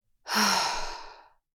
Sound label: Tired woman breathing